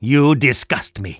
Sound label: disgusted